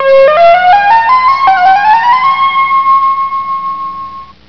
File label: Scale Med; HyperStudio; HS Sounds